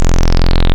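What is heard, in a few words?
square, wavetables; Animoog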